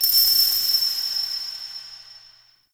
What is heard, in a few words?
Interior Orbital Station Ring; Warframe; Tau